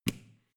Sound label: switch small turn off hard